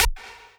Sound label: lead